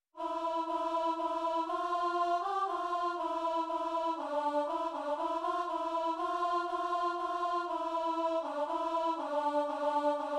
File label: alto